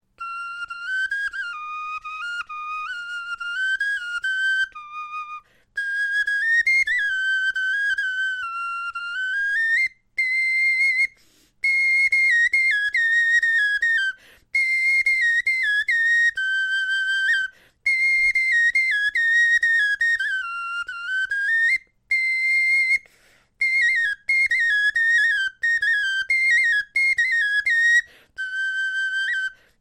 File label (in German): melodie